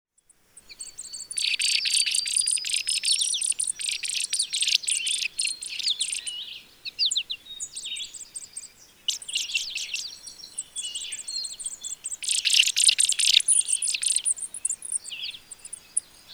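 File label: sounds of common savannah sparrow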